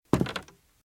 Footsteps - Wood - Creaky - Walk - Right

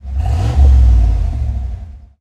91 Firebird Rev, Automobiles

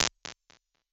282 Delay, Impulse Responses; Reverbs; PastToFutureReverbs; SST; CLA; IRs

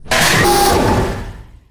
Pickup Scifi Energy; SFX Kit

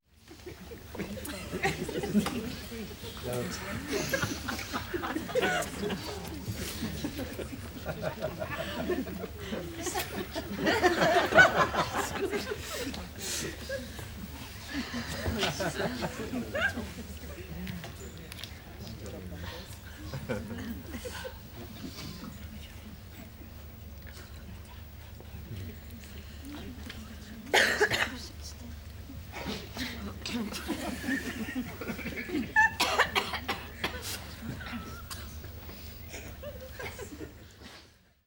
Studio Audience, Interiors With People

